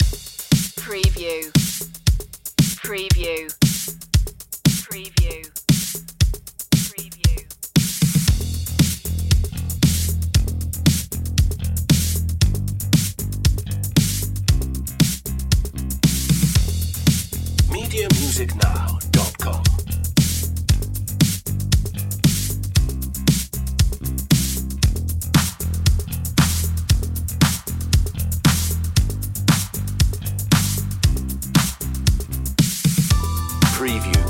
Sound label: daft funk alternate mix